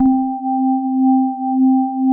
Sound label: DWGS SINE; TR PADS; Pro-Rec - Synthsonic